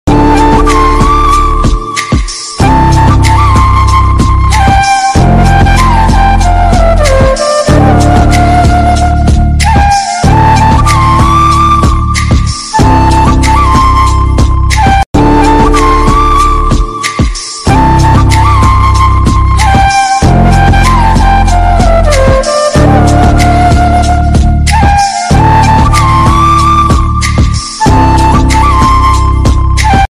maa instrumental ringtone mom instrumental ringtone maa sad ringtone